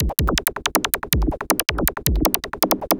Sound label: Electronica Drumloop; Drums